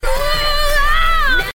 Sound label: angry